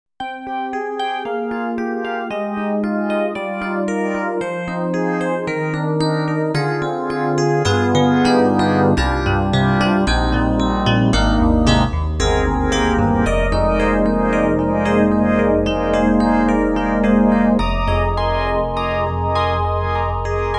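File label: SD-90 Classical Electric Piano Phase; EDIROL; E.Piano 2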